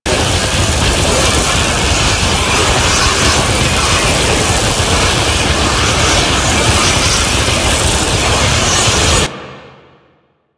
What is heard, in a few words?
Tengu tornado loop; Warframe